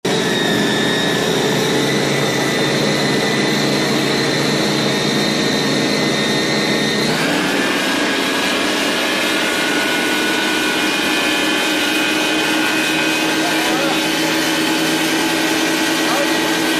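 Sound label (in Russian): ezda na gonoch lodke, racing boat ride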